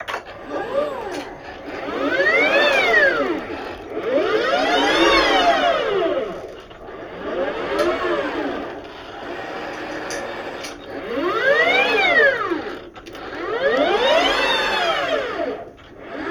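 Robot Movement Industrial Robot Working Performance Variations Rear Mono; Justsoundeffects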